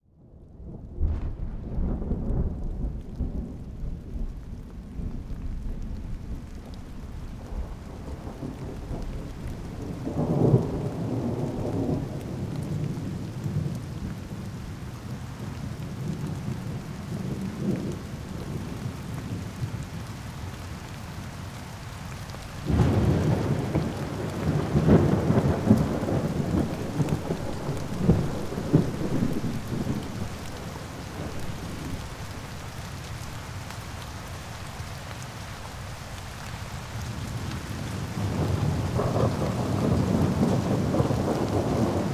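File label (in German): Sanfte regengeräusche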